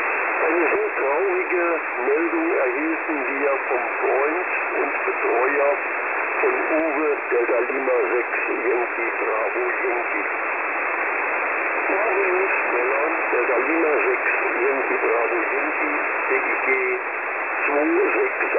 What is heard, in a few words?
websdr recording 3777.0kHz